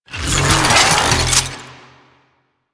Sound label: Short Bow Charge; Warframe; Duviri; Dax; weapons